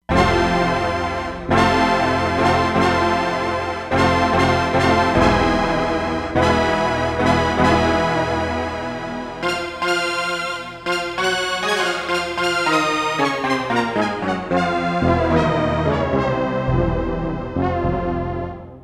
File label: Ben Hur, E-mu Proteus-3; Factory patches